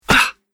Body Blow Punch Ahh; With Slow Echo; Punch And Hit Sound Effects; Human Body Sound Effect; Andrew Fleming

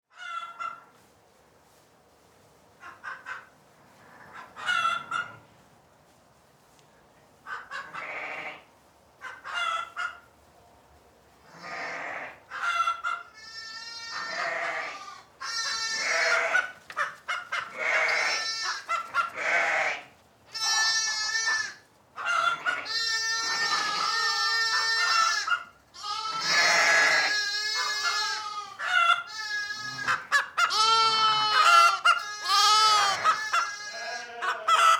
Ambience Farm Sheep Chickens Movement; Backgrounds

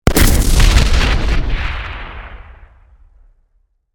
Explosion Complex, Blast; Warfare; Bomb Sound Effects; BlastWave

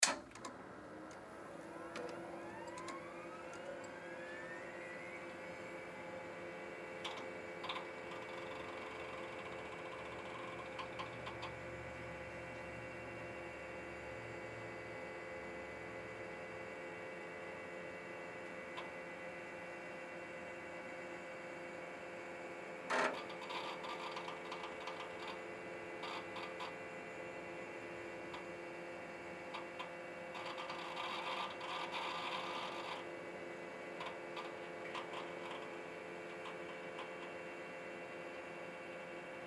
Computer Vintage Turn On Power Up; Computers; Technology; Blastwave

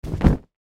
Cloth Toss Heavy Blanket; Foley; Blastwave

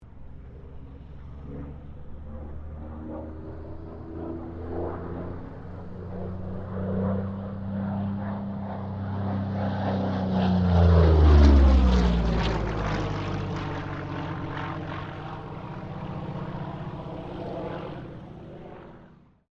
AIRCRAFT P-40 KITTYHAWK PASS STEREO; P-40 Kittyhawk / Warhawk / Tomahawk; Airplanes

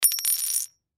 Coin Drop Single; Money; Foley; Pirate Gold; Objects; Blastwave

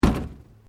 Metal Light Movement Minor

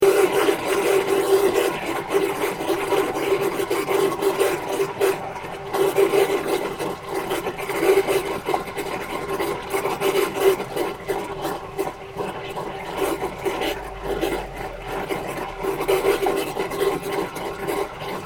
Water Drain Construction Gurgling Loop; Domestic water movement; Nature

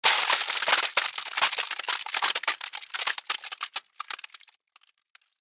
Weather Ice Crack Long; Snow

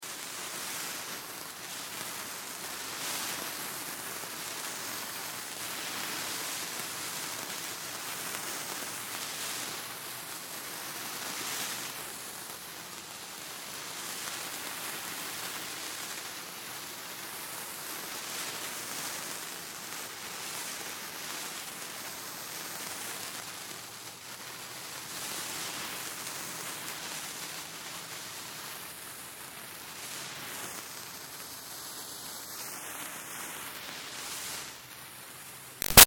Fire Sparklers Multiple Burn Gentle

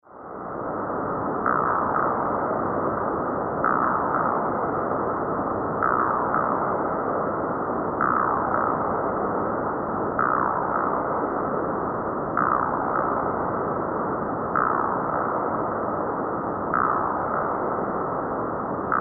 Science Fiction Alien Jungle Ambience Insects; Sci-Fi; SFX Bible 6

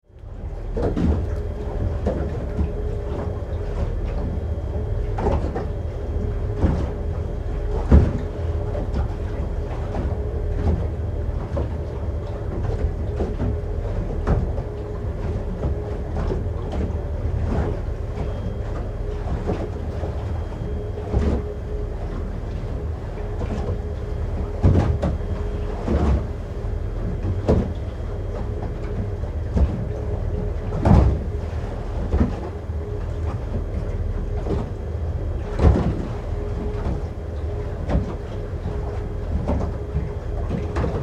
Boat Drive Slow Interior Bow Waves Splash; Vehicle; Boats

